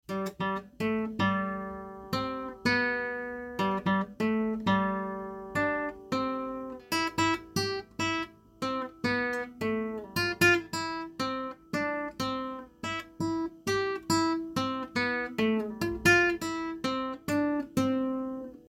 parabens violao

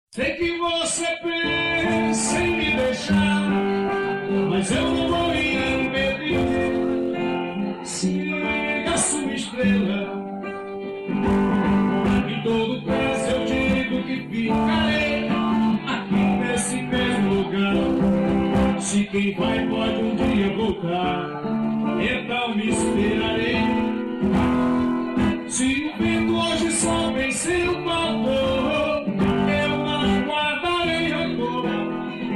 voz e violao

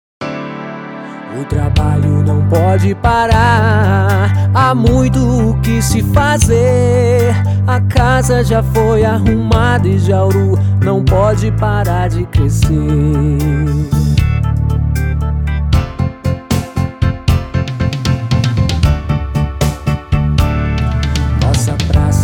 jingle eleicoes prefeito emotivo

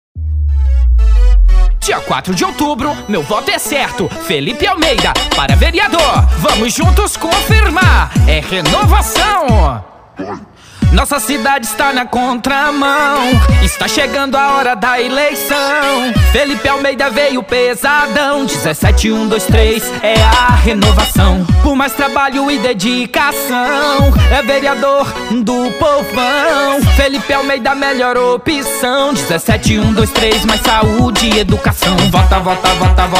jingles politicos funk paródias funk; eleicao 2020

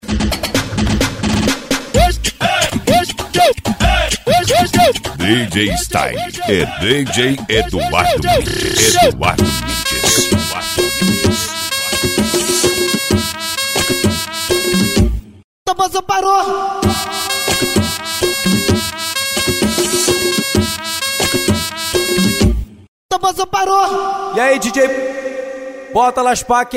tambozao funk

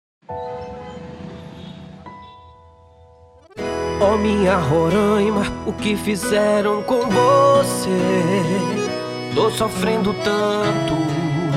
jingles politicos deputada federal

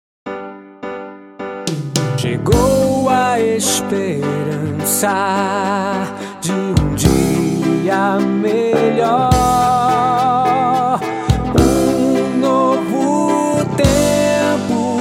jingle eleicoes prefeito emotivo; eleicoes 2020; prefeito 55

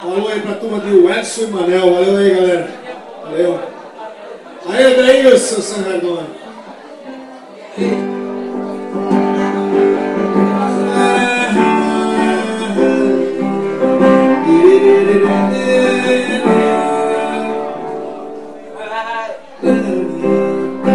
voz e violão

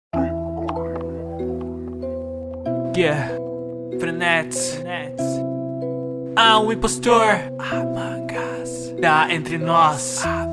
rap; among us

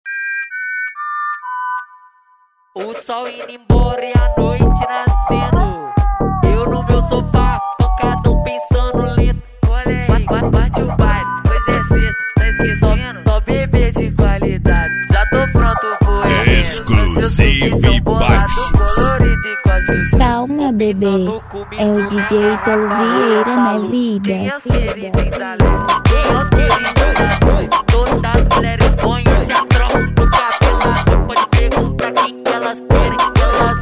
baixos eletro funk

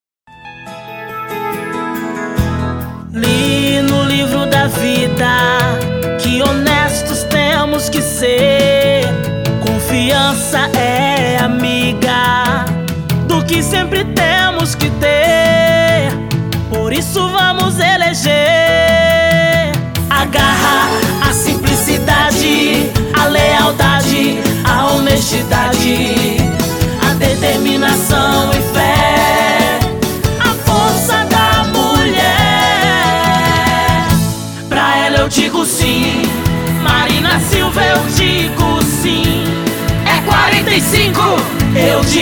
pop jingles politicos

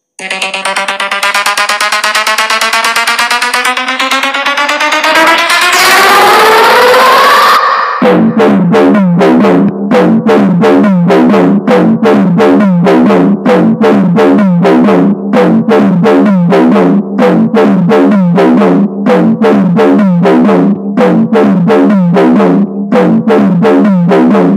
beat automotivo

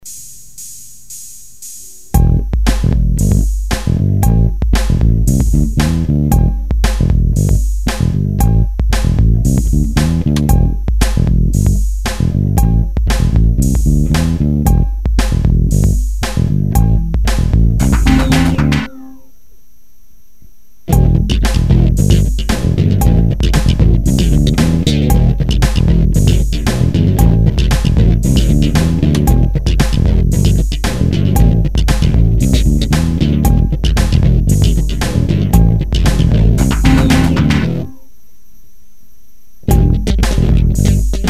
pagode alto astral, bass lines

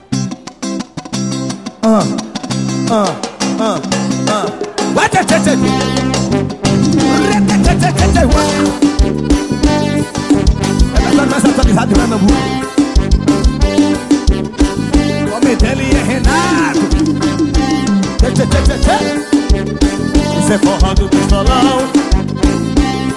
forro

